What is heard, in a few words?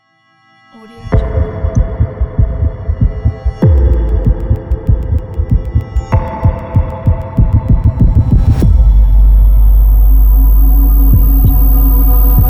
dangerous deep leap logo